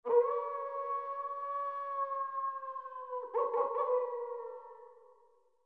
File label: wolf